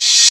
Rev Cymbal; 094 Emin

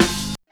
Snare, 085 Cmin

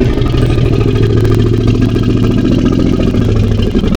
Elephant, Wavetables; Cymatics; Serum; Animals for Serum - Gold Edition

